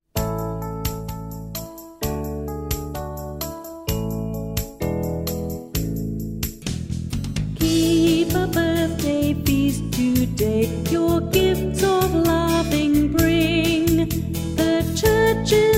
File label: A Birthday song; Pentecost